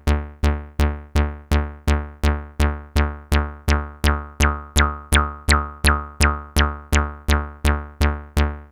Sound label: 4pole res, Serge Modular